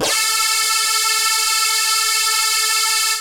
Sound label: technosyn2, Techno Trance; Ueberschall; SYNTH